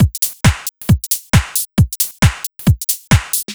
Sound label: 135 House Muzik, Drum Loops; M8; Electronisounds